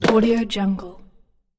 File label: plastic box